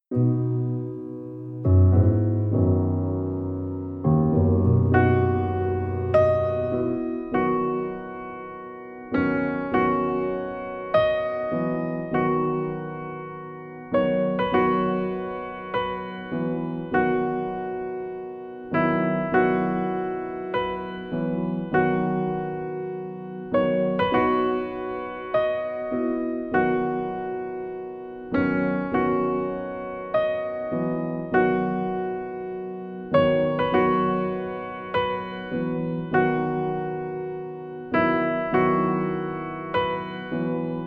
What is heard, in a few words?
Piano, Water Flow Interpretations